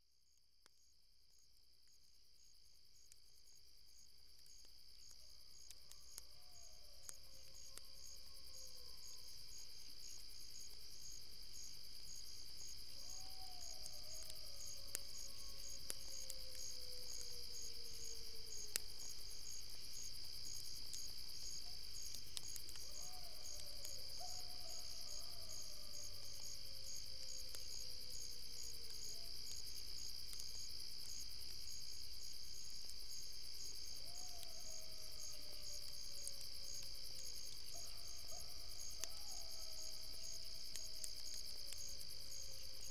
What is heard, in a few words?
Coyote and Crackling Campfire; Sound Effect